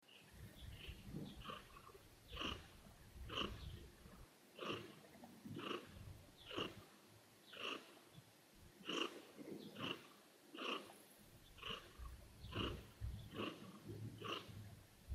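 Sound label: cat dozing next to its owner and purring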